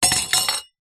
bell small reception desk bell down on wooden desk